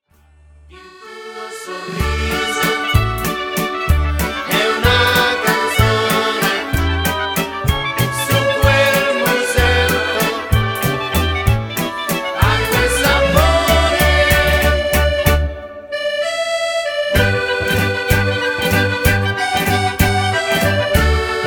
Valzer Canto

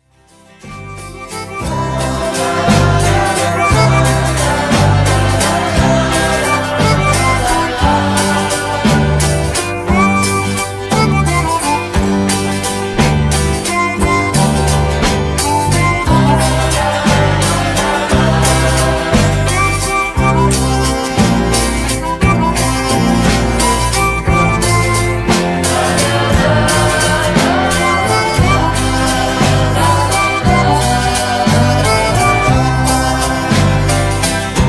Canto

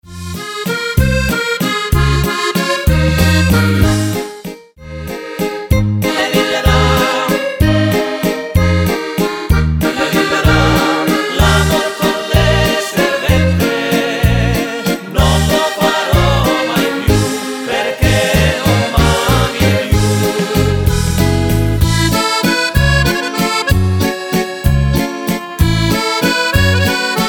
Canto Popolare Valzer Canto

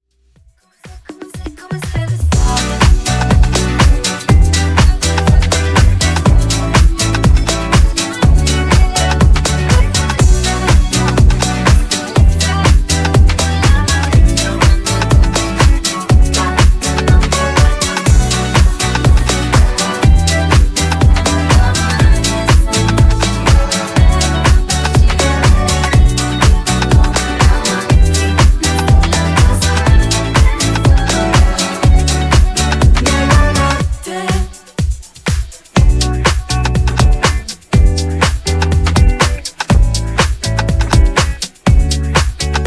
Ballo Di Gruppo Groove Continuo

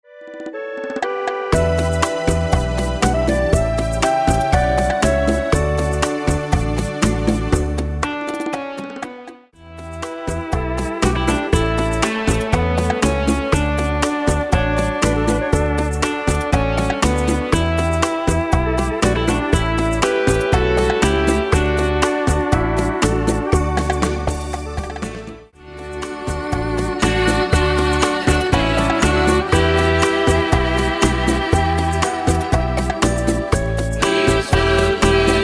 Bachata Beguine Canto

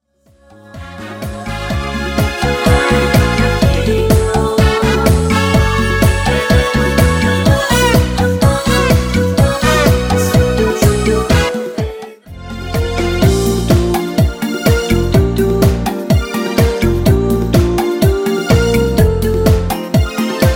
Cha Cha Cha Ballo; Ballo Di Gruppo

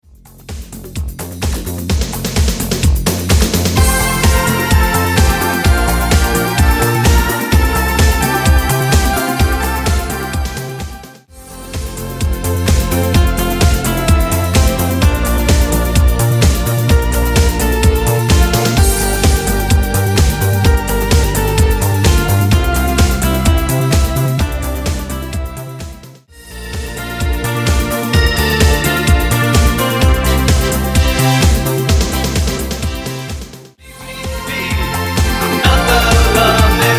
Ballo Di Gruppo Canto

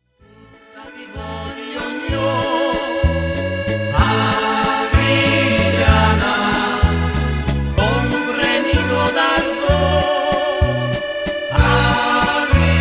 Valzer Canto

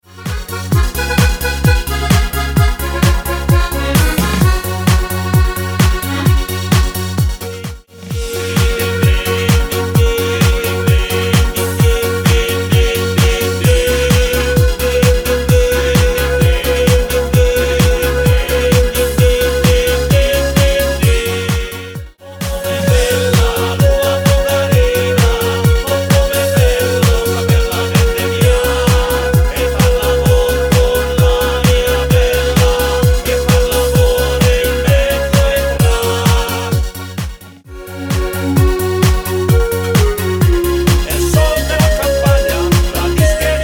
Ballo Di Gruppo Canto

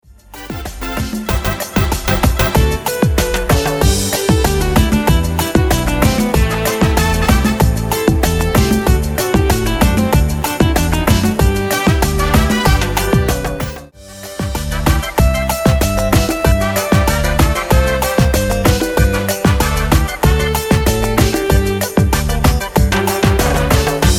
Medley Ballo Di Gruppo; Canto

